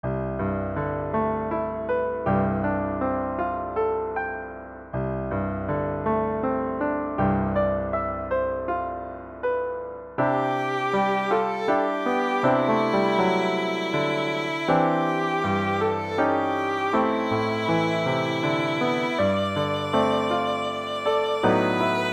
silent vn; 고요한 밤 거룩한 밤

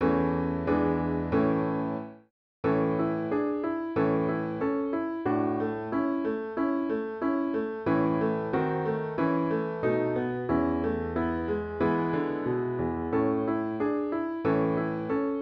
WITHIN piano; violinmethod

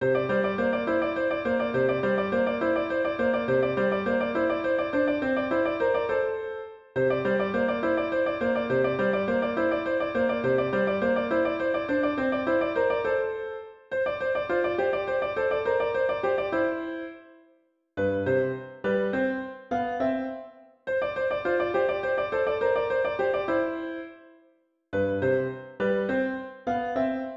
melodic etude, 바이올린; heller stephen

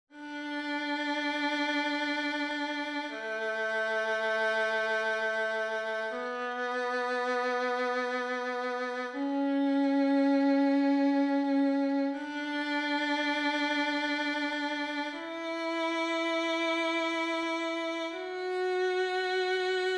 The D Major Scale violin; violinmethod

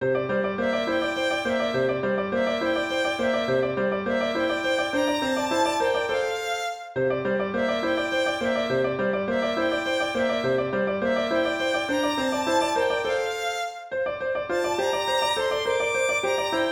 melodic etudes; heller stephen

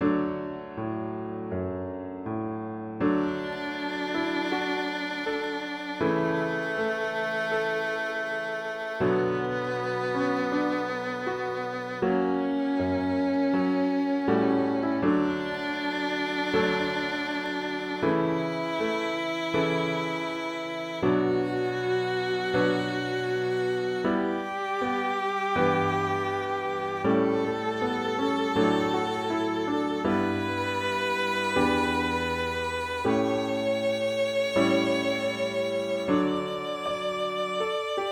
The D Major Scale; violinmethod

